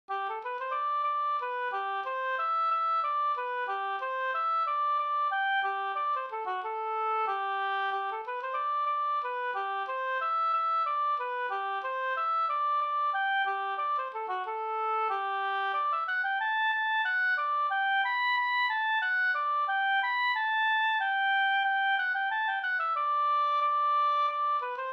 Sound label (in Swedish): slangpolska